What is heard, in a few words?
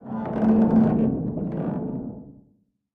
plane metall stress underwater; War Thunder